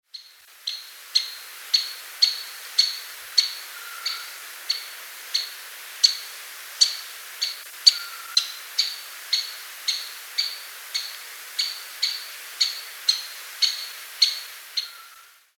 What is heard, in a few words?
crimson breasted woodpecker